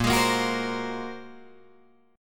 Bb 7th Suspended 2nd Sharp 5th Bb, Guitar Drop D; 8,11,8,11,11,8

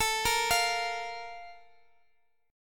Bb 5th A; Bb5/A arpeggiated

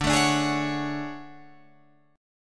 D Major Sharp 11th D Guitar Drop D

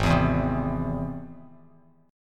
Gsharp Augmented Gsharp Bass Standard; G#+ strummed; 4,7,2,5; JGuitar

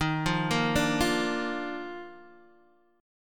Eb Major 7th Suspended 2nd, Guitar DADGAD; down

